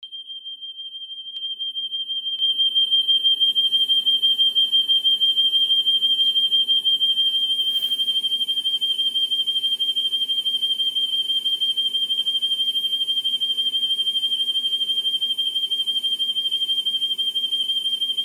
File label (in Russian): detonacia